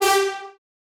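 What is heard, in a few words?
French Horn G4; Aubit; Gray Vol. 1 Serum Noises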